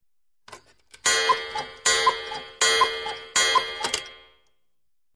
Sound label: Cuckoo Clock